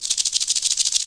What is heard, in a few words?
attack